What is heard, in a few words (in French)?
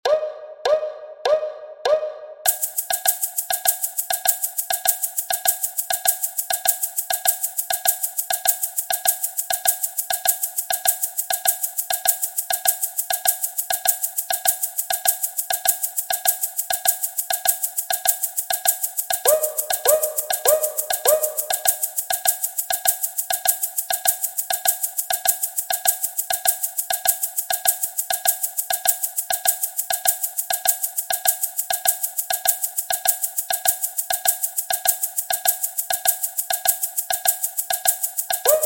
Batucada Cloche